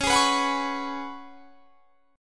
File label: Db 7th Suspended 4th Db down; strummed